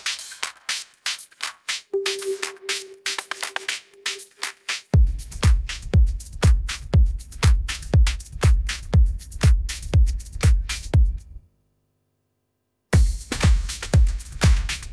ringtone edm

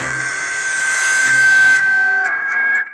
notification

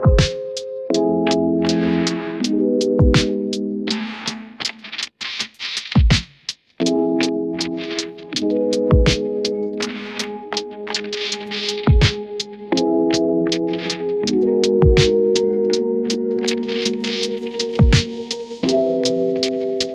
ringtone lofi

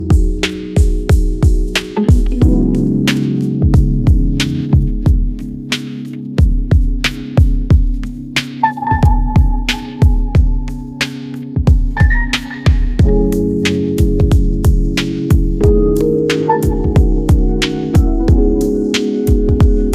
ringtone rnb

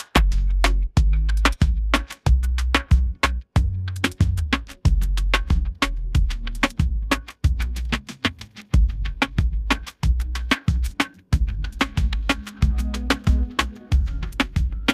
ringtone reggaeton

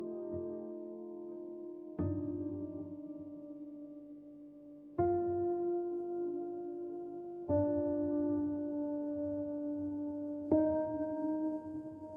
ringtone emotional

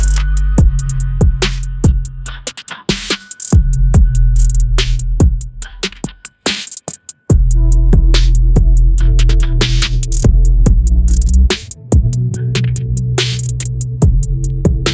ringtone hiphop

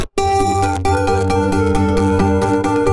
notification

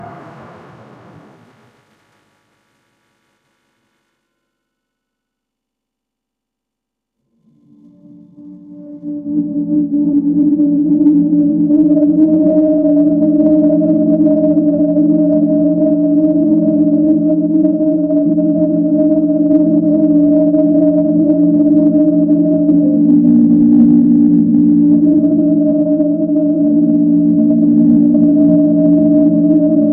ambient nature

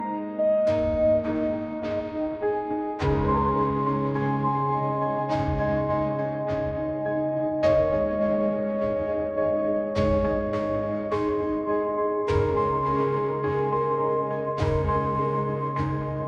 ringtone anime

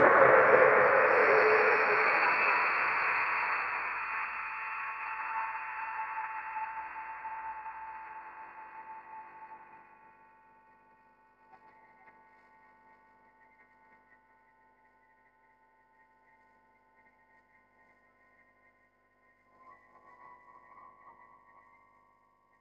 ambient nature

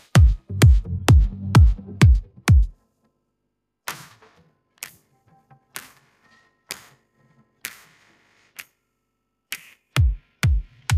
ringtone edm

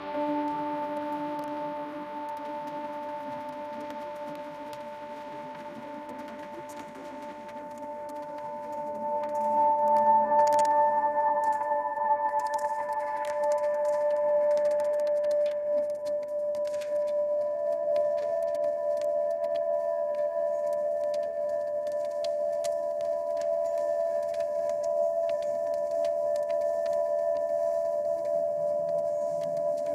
ambient nature

